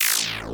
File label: slash attack; undertale